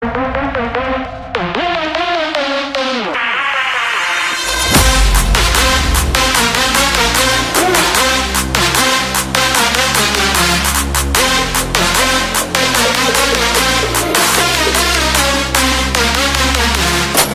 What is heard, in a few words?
trance romantic ringtone